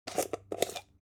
object jar plastic midsize open short

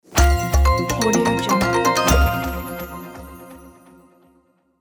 win logo

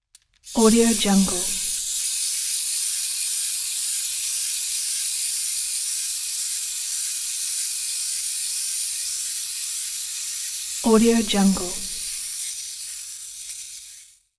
wind up toy

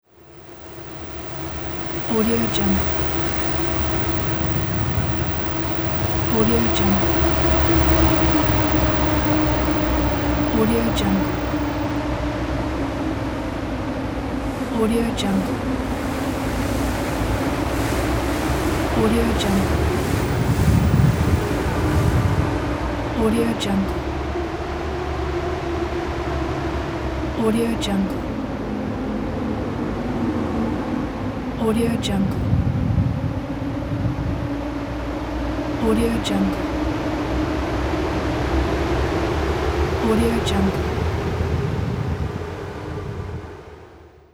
wind

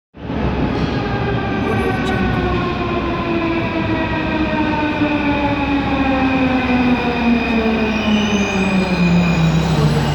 metro train arrival

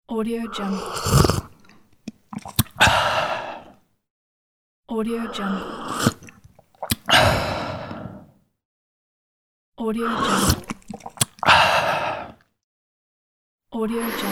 drinking cofee; catsoundstudio

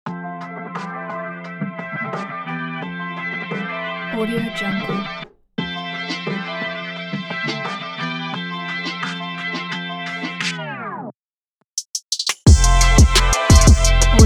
hip hop

